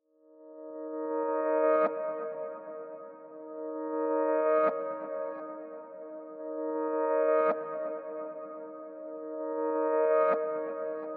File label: Nostalgic Melody Loop - 170 BPM D# Min Reversed Piano; Melodies; Loop Stems; Cymatics; Oracle Sample Pack